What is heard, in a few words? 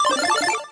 1up Item Mono